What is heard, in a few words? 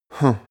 male sigh disappointed